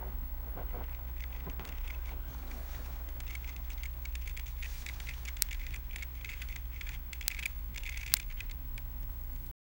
someone turning on the li

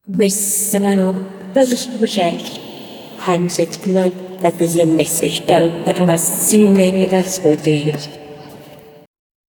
ambient female footsteps; time travel

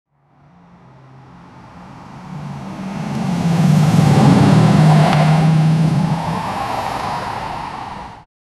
car moving and then accident; accidents

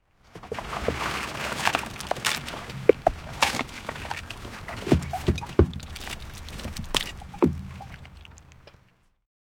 foley soundscape no music, oars

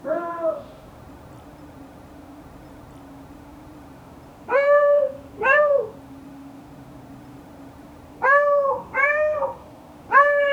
a cat and a dog

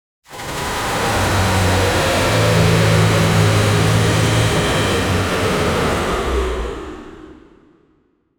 a menacing reactor sound, spacecraft

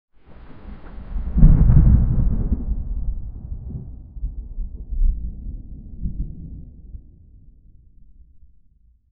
soft rolling thunder deep; 3d stereo

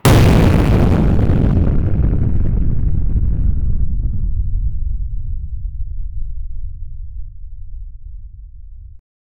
fireball fantasy

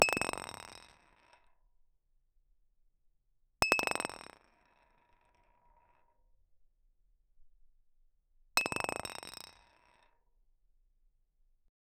a rolling ball close on

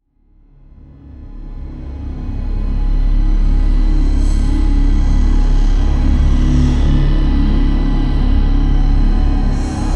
cinematic video travel, travel

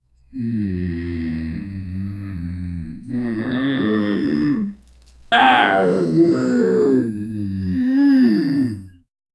crowd murmurs with subtle

